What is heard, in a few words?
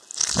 squish undo